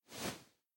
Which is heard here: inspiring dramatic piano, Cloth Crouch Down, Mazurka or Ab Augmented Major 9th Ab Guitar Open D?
Cloth Crouch Down